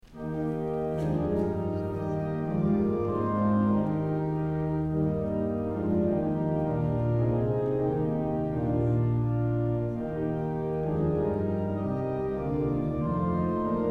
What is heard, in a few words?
hymn